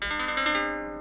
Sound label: o2 Boot